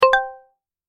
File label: notification